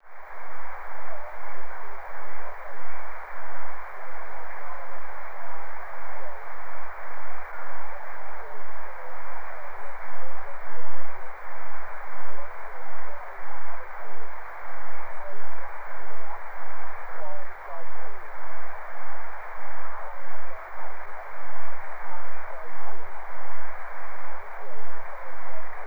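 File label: 1296 single sideband; ssb; 1296 eme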